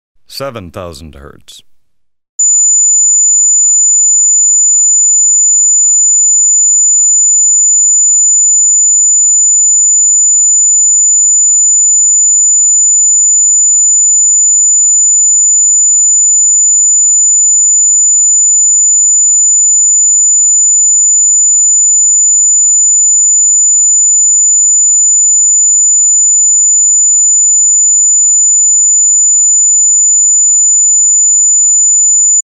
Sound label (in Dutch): Een sinus van 7000 Hz, -10 dB